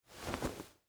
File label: Short Sleeve Fold, Clothes, Shirt, Over, Arm; Foley; Dressing Sound Effects; SoundEffects-BlastWave